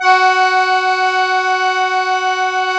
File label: KEY Cajun, Cajun Acord; Club-50 - Foundations Roland; Accordians